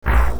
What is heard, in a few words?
perc; gas